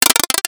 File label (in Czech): Akordy F dur